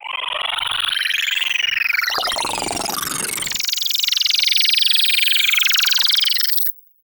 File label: alien being born, creature